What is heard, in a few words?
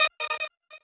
normal hit normal